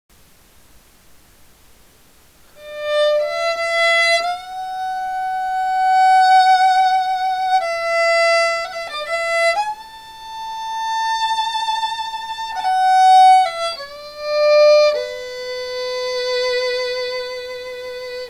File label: Violinist, violin solo; Excerpt